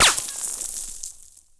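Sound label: ricochet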